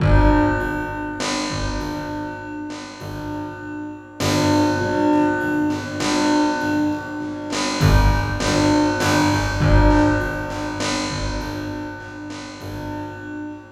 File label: Robo Beat, Percussion